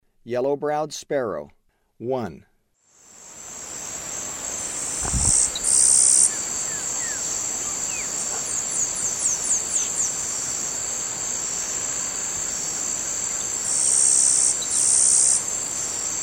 Yellow-browed Sparrow; S. America; Eastern Lowlands

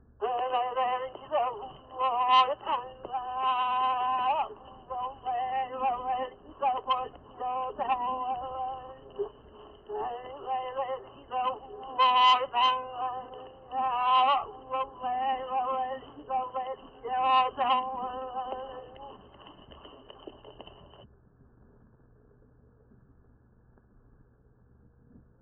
Chant of affectionate lamentation, Hawaiian; World Music; Na Leo Hawai'i Kahiko - Voices of Old Hawai'i

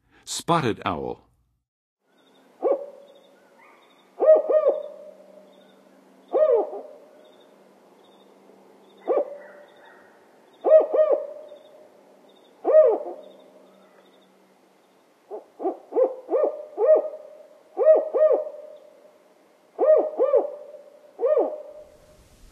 Spotted Owl, Birds of North America; Western USA; Western USA Songbirds

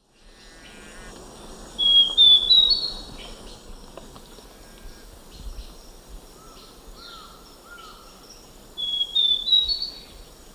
Myrmeciza castanea, song; S. America; Eastern Lowlands

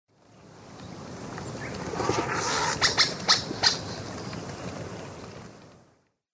Molothrus oryzivorus, flight calls; S. America; Eastern Lowlands

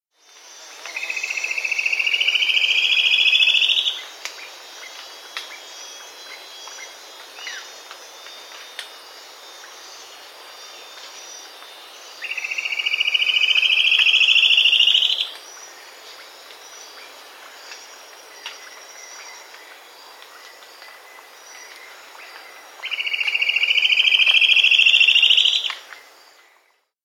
Hooded Gnateater; S. America

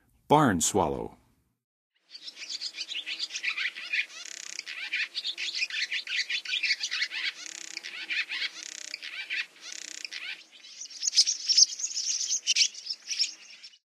Barn Swallow, Songs of Birds; North America; Western USA; Western USA Songbirds

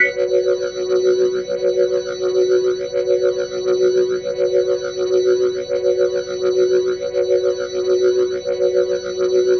Organ F# Sus; Ambient Cinematic; Organ Licks

